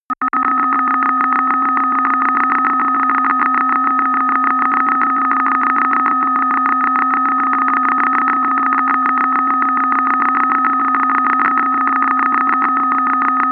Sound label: atmosphere old scanner